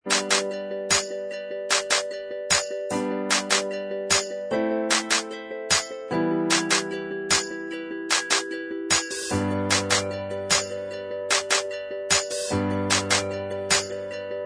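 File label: instrumental track, backing tracks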